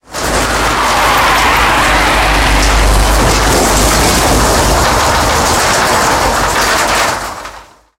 turn wheel, tanks; War Thunder

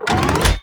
biomech ready flak gun

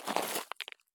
turn gravel; Escape from Tarkov

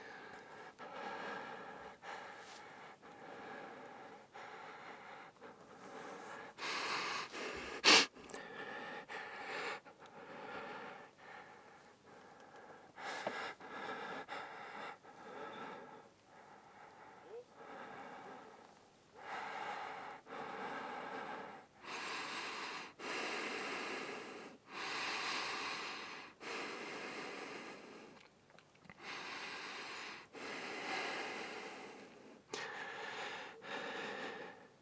breath ok; Escape from Tarkov; scav4

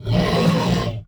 attack; Rust

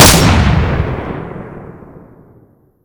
aa12, shotgun; weapons; ModernWarfare

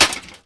Armour Hit; Civ4

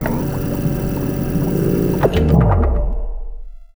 hack sequence

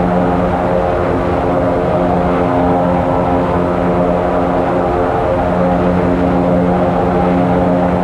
Aircrafts Engines Tu95 Back Engine; DCS

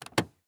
plasticcase heavy open; Escape from Tarkov

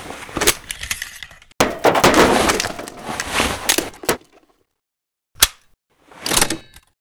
Vehicle reloads; Red Orchestra: Ostfront 41-45

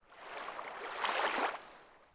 Oasis Wave; Ambient; Civ4 warlords

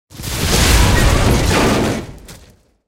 tanks explosion metal; explosions; War Thunder

